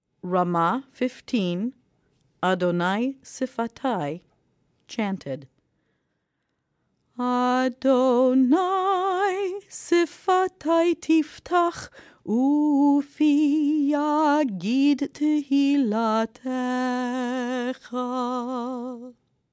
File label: adonai sfatai chant